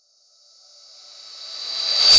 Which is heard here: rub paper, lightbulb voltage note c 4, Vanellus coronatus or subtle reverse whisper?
subtle reverse whisper